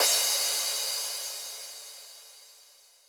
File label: crash